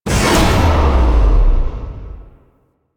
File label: Rally Cast Release; Warframe; Warframes; Hoplite